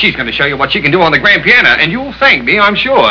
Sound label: Grand Piano; sylvia scarlett